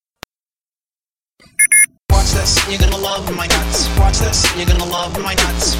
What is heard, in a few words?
Rap Chop Sms